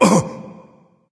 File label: surprise; fafnir